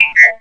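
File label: droid astro hit; Star Wars Knights Of The Old Republic I; XBOX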